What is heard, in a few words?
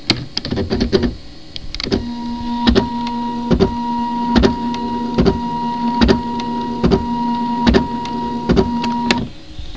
PRINTER, COMPUTER